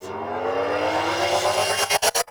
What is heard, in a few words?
Cart Turret Energy Ball Charge Up; Venus; Turrets